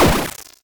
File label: sfx exp short hard